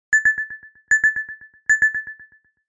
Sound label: 3x beep